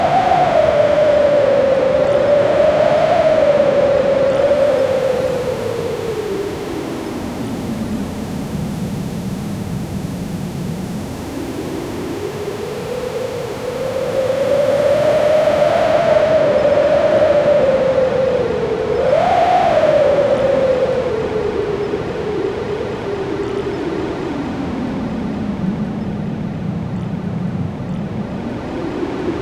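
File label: 1980s animated movie style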